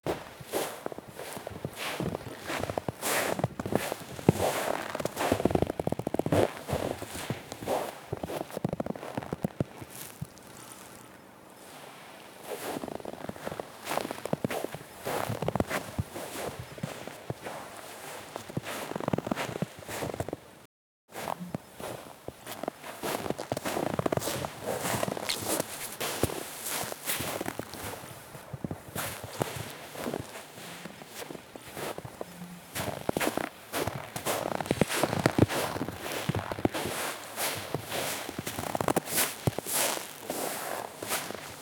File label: footsteps on soft sand; crunching